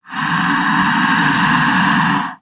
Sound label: Cobra Indian Hiss Clo, snakes